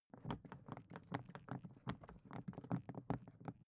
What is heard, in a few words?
briefcase plastic walking movement; industrie wirtschaft; bueroarbeit